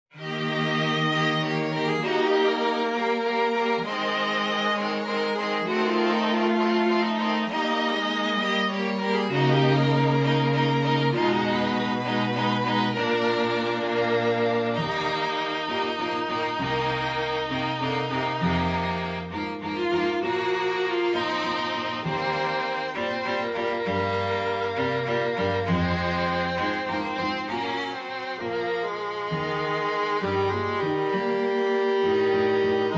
STRING QUARTET; ArrangeMe

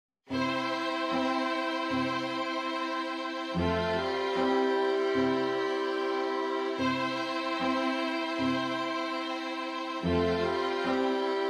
STRING QUINTET

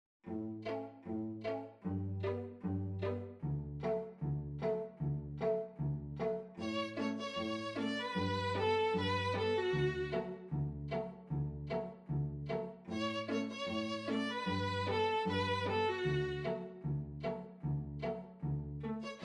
STRING QUINTET

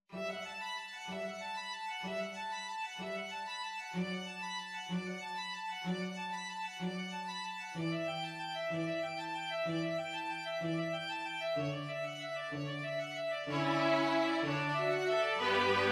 STRING QUARTET; CONTEMPORARY – POPULAR- JAZZ STANDARDS

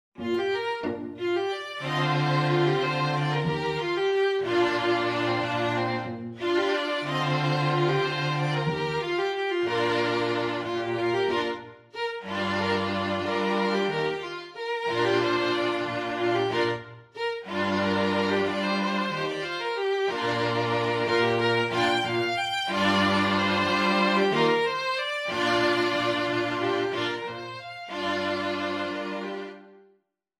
STRING TRIO

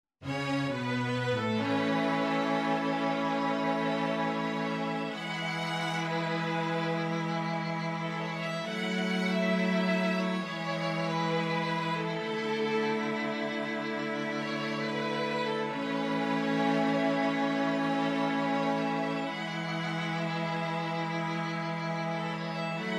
STRING QUINTET

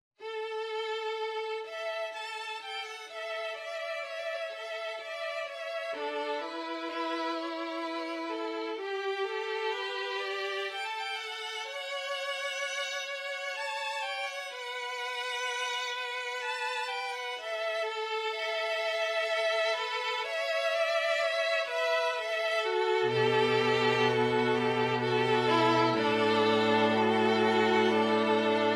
TRIO

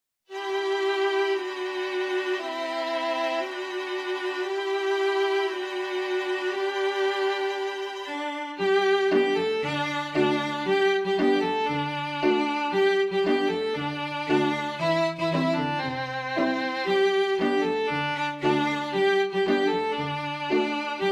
STRING QUINTET

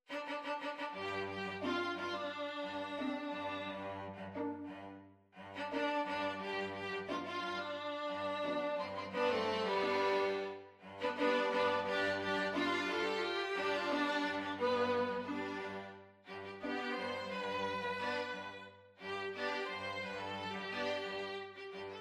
STRING QUINTET

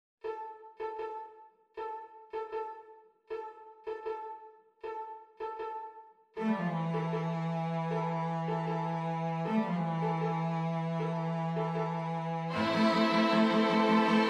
STRING QUINTET, INDIAN MUSIC

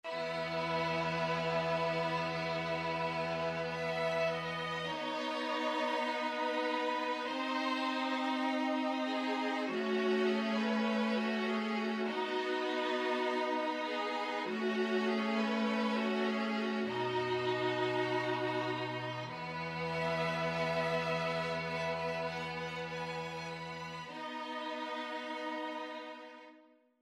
STRING TRIO

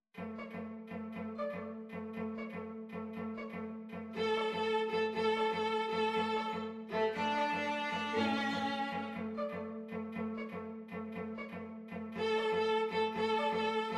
STRING QUARTET

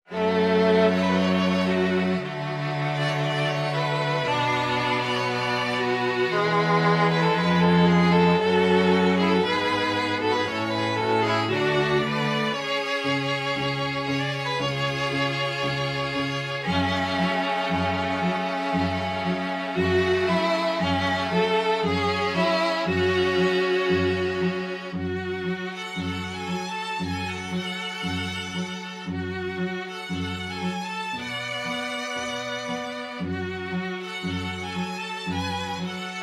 STRING QUARTET

